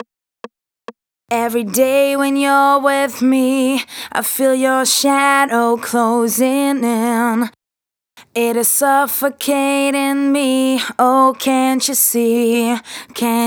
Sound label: Verse B, 136 BPM; Vengeance Samples; Breakaway